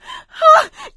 scream while pounced, survivor voice; Left 4 Dead 2; producer

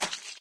flesh, footsteps, run; Left 4 Dead 2

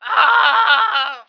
burn dying; Emperor - Battle for Dune

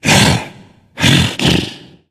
tank growl; voice; idle; Left 4 Dead 2

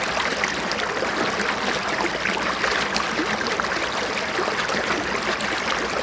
Bubble Water; GrandFantasia

